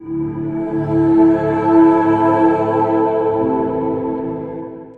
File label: drip chords